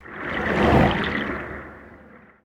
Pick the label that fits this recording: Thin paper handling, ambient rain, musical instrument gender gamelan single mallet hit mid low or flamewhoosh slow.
flamewhoosh slow